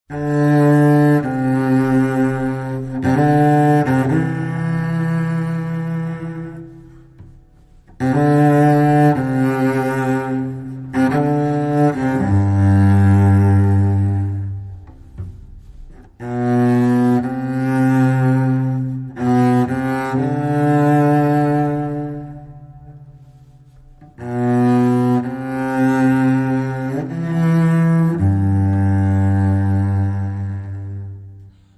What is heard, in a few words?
Dry Cello Only